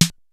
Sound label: XR-10 K+SN+H, drums; Rubber Chicken Software Sample Bank; DRUMS + PERC